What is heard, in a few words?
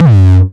909 Grime Layer 2 - F#2, Drums, Kicks; Mbase 11